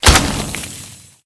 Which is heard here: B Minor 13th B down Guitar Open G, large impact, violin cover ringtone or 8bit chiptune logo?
large impact